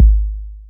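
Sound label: DANCE 808 KICK; Pro-Rec - Synthsonic; TRINITY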